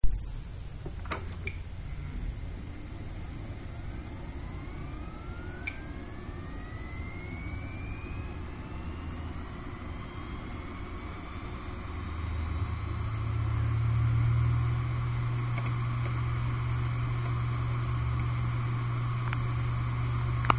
idle, hdd; hitachi 7k400

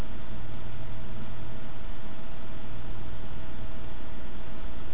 antec low rpm

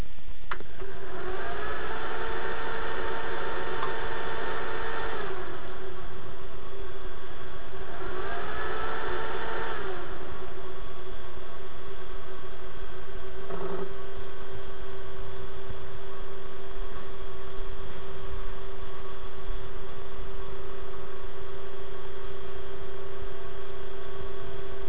shuttle start with wd

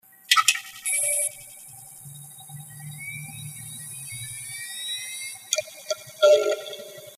raptor startup, hdd noise; seagate barracuda 400GB noise

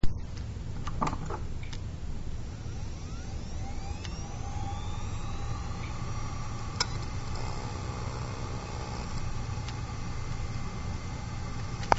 IBM 75GXP, hdd

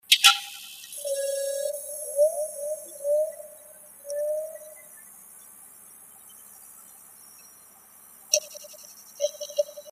startup, hdd; seagate barracuda 400GB noise

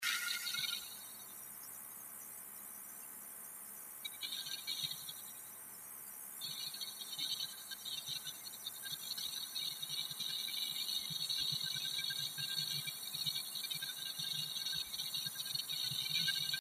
activity, hdd noise; seagate barracuda 400GB

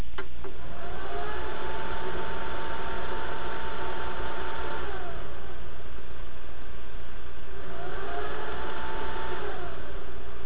shuttle start with seagate

